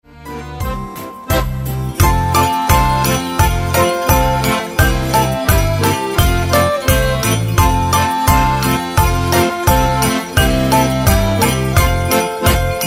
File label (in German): schlittenfahrt